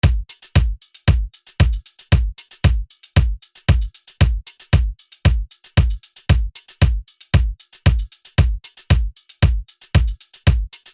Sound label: Mambo Rework